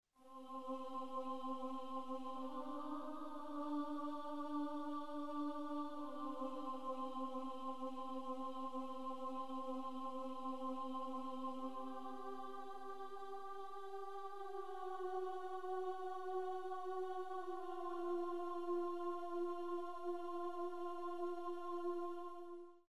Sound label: String left; QFG1